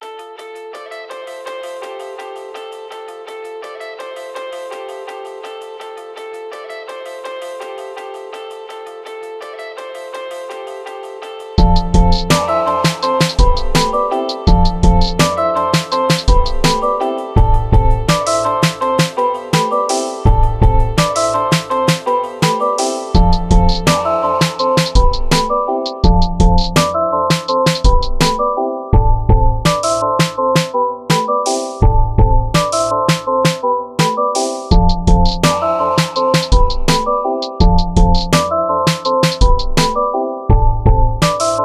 major synth alert; inside of the trap house

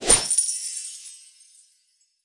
Reward Coins; subway surfers city sound effects; Android, iOS

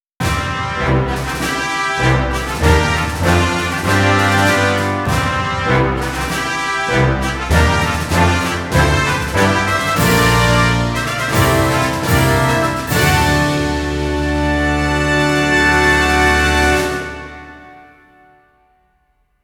Fanfare; Fanfare Friend Race; starhorse original soundtrack 2025

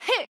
Swipe; Jackie

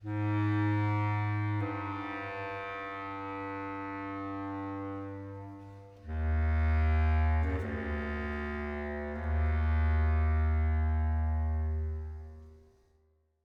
Solo Overlay Phrase 80bpm 4-4meter

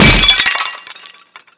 Pottery SFX KO; Skylanders Cloud Patrol; Arcade, iOS, Mobile

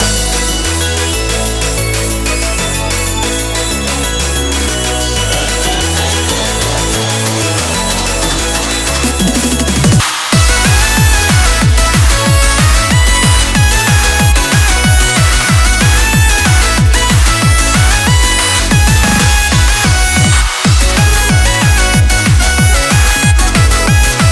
BGM Reach

